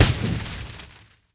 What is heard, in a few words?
Pinata Explosion; candy crush saga gamerip